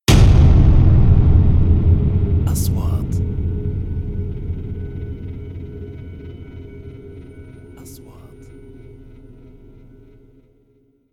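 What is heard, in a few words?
3D SFX Trailer; مؤثرات تشويقية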